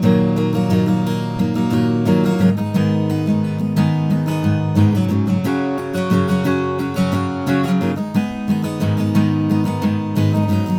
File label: guitar loop